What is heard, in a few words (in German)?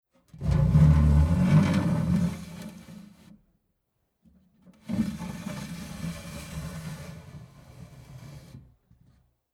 Container Componibili oberste Schublade oeffnen schliessen